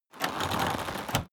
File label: crossbow load